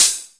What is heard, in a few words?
Open Hhat, HiHat; Equipped Music; Loungin House